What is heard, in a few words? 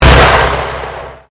Convolution Pulse